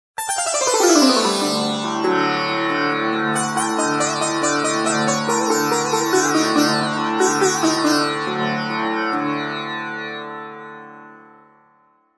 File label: sitar